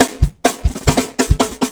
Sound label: Loops Fills; Vengeance Samples